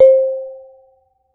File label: Bonang, Gamelan